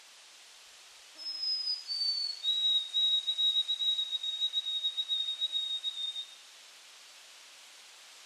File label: White throated Sparrow Zonotrichia albicollis